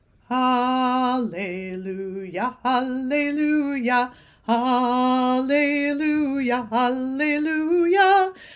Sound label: Psalm 150 Chorus